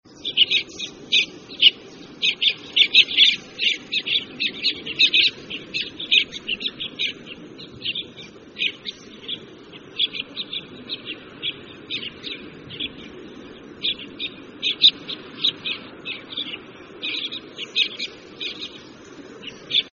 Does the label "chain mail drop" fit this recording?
No